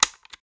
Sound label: Button